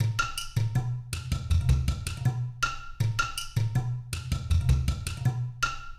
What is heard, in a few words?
udu; eastwest qlso